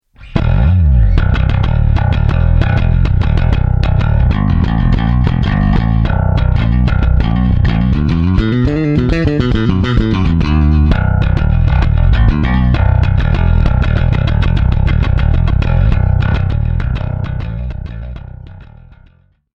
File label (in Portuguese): Metal graves